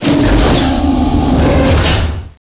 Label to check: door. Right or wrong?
Right